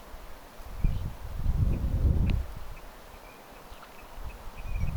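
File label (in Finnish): hyvin erikoinen ääni läheltä ja hiljainen uuuuh-u mahdollisesti luhtakana; hyvin hiljainen uuuuh-u - ääntely?; Luhtakanako?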